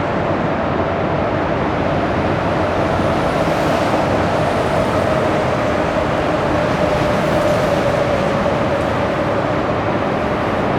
car ambi tunnel, street traffic; best service Blue Box

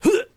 Hit

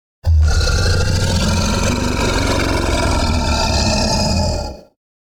Dinosaur Growl Roar - Monster; Hollywood Edge; sound designer's toolkit v2

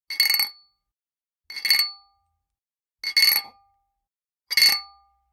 Generic Click Glass Small

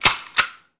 Gun Handling Weapon, Weapons Foley; SFX Kit

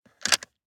DICE on Plastic, Grab, Standard, 6 Six Dice; Samples; ArticulatedSounds; DICE Sfx Party (Extended)

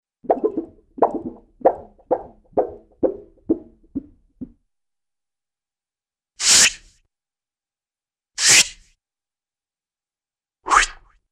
Various Swishes, Cartoon; Hollywood Edge; Cartoon Trax

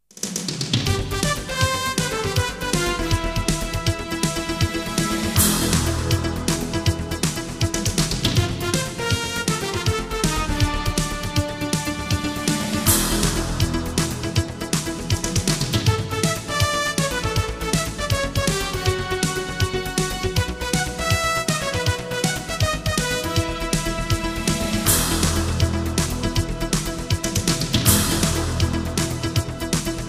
Jingles; 90 DDD Jingles

